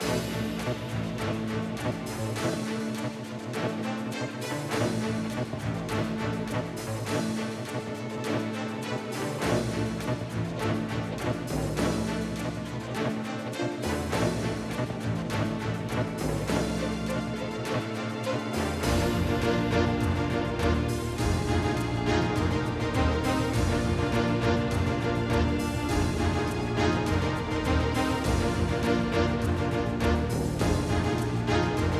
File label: background